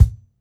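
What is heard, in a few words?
kick, Roland MV8800 drum kit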